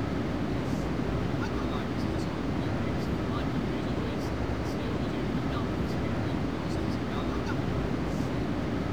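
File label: train ambience; general trains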